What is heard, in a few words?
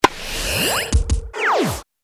bounce